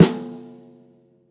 dark sounding acoustic snare sound f key, steel snare drum sound